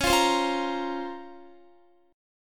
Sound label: Db Major 13th, Guitar; strummed; JGuitar